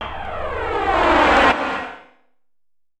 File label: D+B 160; eJay